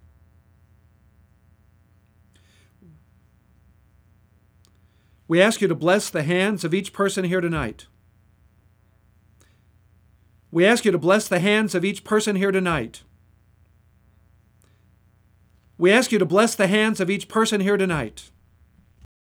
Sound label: hands